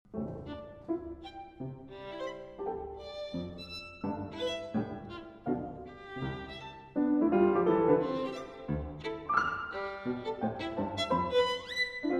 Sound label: Suite For Violin and Piano, March; Op. 6; Allegro Alla Marcia